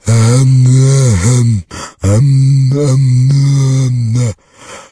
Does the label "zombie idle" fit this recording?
Yes